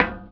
step ladder; SpecOps2 MPlayer Demo

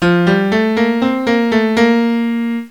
piano; MOBICLIC 4

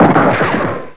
torpedo; Amiga Format AFCD42; galaga wars

